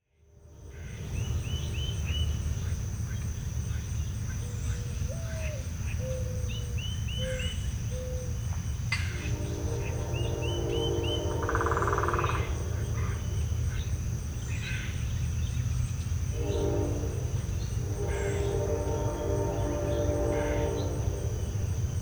earthquake

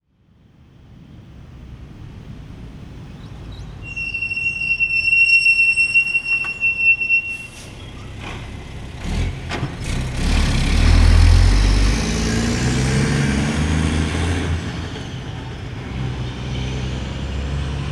Brake Time

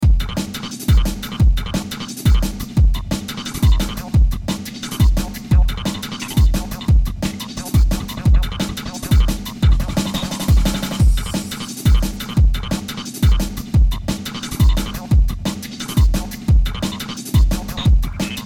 Juno Wub Machine

